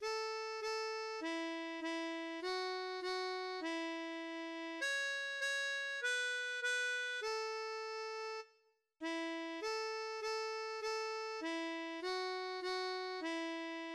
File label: Old MacDonal had a farm, harmonica